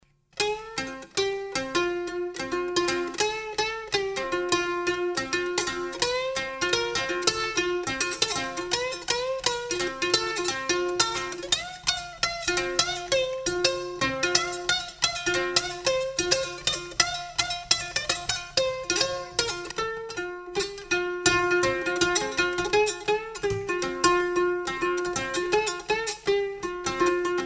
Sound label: Diddley Bow